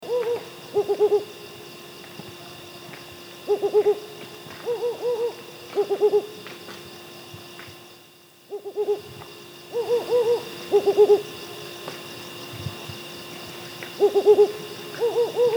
Mottled Wood Owl Strix ocellata grisescens, mottled wood owl call